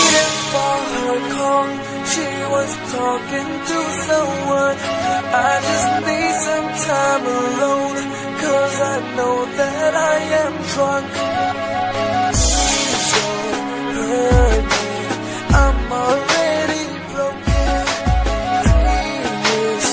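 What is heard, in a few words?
lovely english ringtone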